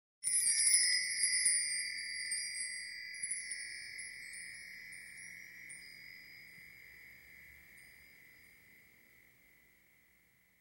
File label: bells